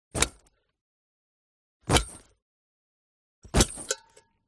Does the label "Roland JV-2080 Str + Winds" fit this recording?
No